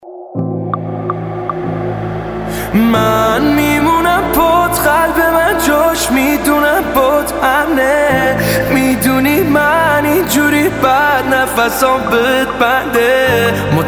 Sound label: Piano Version